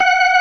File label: organ, all stops; Keith Emerson Signature Series